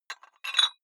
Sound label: dumbbell put on very small weight hit; sport freizeit; fitnessstudio